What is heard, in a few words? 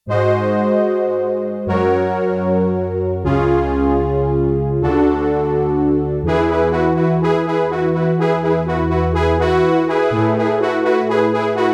SYN BRASS, Roland JX-1; Factory presets